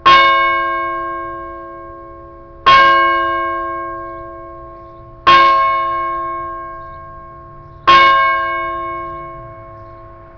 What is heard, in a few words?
SINGLE churchbell